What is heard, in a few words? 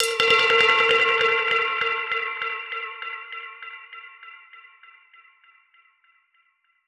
Perc Hit, dub percussion one shots; musicradar